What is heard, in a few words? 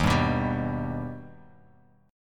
D sharp Augmented D sharp, chord; D#+ strummed; 11,10,9,12; JGuitar